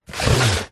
cardboard box parcel heavy slide wood deck external; zapsplat